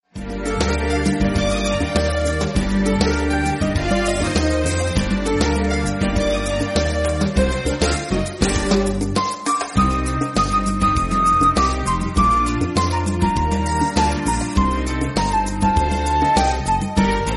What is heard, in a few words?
persian night